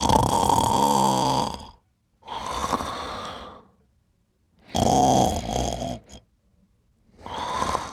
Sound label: sleeping